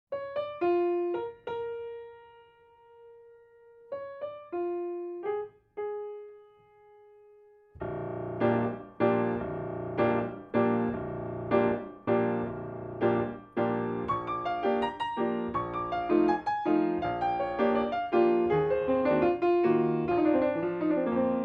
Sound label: Preludes for Piano, Allegro ben ritmato e deciso; Three Preludes for Piano; Prelude No. 1